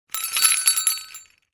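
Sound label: zvuki kolokolchika, small bell sounds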